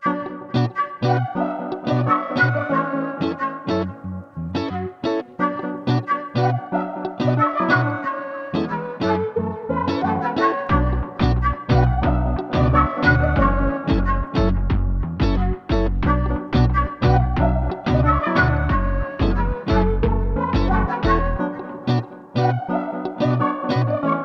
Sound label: 90 BPM B Min, Melody; Maica; Cymatics; Infinity Melody Collection